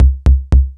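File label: kick, drive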